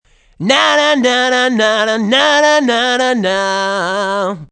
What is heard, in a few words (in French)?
chant; Mobiclic51